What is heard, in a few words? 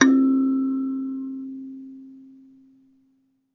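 KALIMBA D2; ILIO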